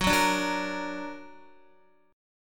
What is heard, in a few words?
F sharp Augmented F sharp Guitar; F#+ strummed